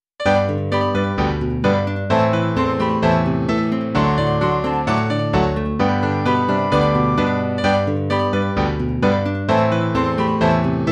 midi

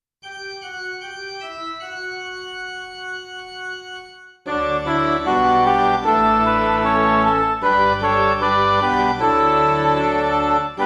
midi

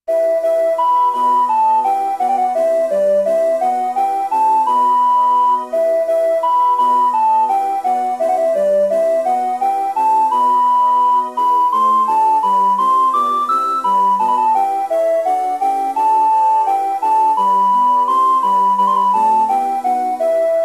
god rest ye midi; God Rest Ye Merry, Gentlemen

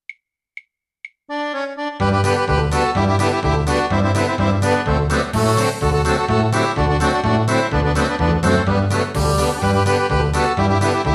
polka midi

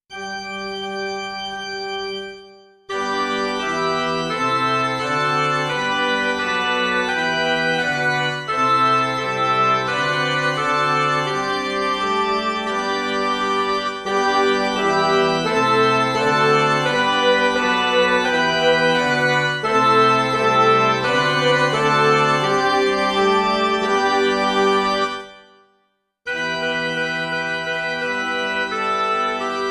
midi

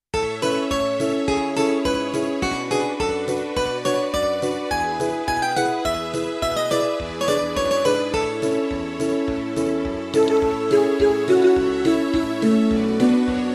midi

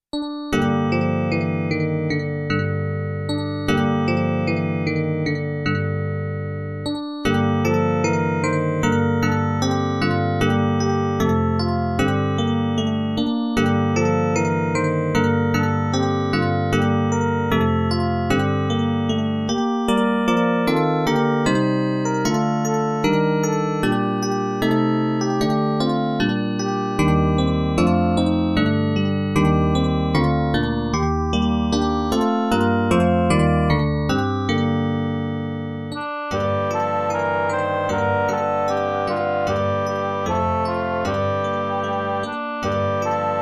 midi

